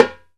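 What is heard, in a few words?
hi timbale center; E-MU Producer Series Vol. 2 – More Studio Essentials; Composer; Timbales Xswitch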